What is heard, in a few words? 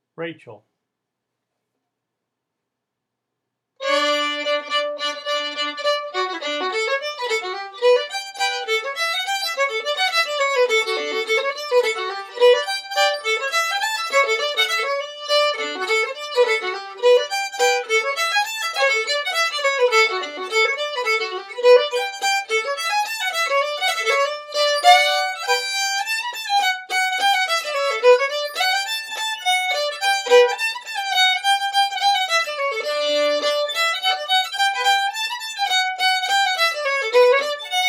fiddle; festivaltunes